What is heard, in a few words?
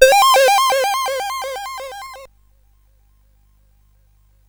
Mini Arcade Climax, CSG8580R5; sid